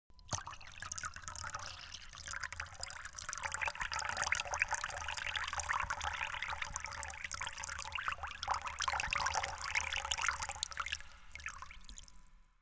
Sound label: Water Trickling